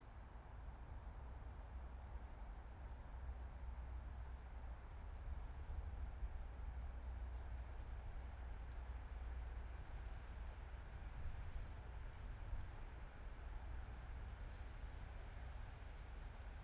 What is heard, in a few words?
yamanakako sound